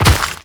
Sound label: Step Dirt, Footsteps